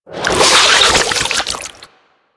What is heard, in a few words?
Exterminate Hive Shell Grow B, Infested; Warframe; Infested Eris; Encounters